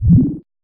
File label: wobble